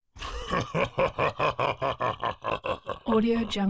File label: dragon laughing evil laugh; human sounds; خنده شیطانی